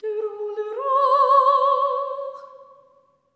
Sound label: SOP. TRAD, Symphony of Voices; Spectrasonics